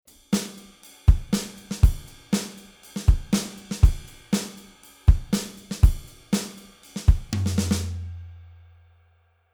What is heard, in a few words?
Artistic EQ Drummer API 2520 Color On 60Hz 3dB Bell 8kHz 2db Shelf; Fredenstein